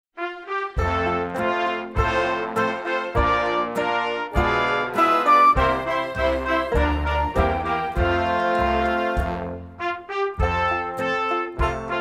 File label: Polly Wolly Doodle fast Acc; recorder karate